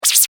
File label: Production Element Whoosh Fast High Pitch; Sound Design